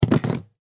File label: Drop Firewood